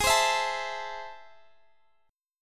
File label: A Minor Ab, guitar; Am/Ab; strummed